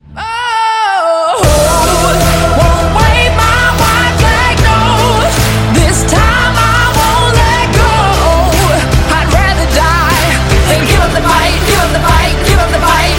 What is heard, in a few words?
ringtone